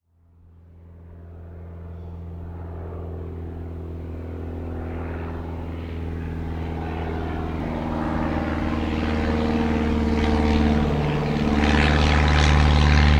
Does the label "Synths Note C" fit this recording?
No